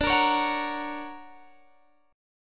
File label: D Augmented Major 7th, guitar